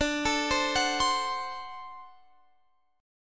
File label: D 7th, down, Guitar, Standard; D7 arpeggiated; capo=3